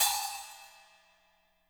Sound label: hat, micro kit; Spectrasonics; Burning Grooves CD2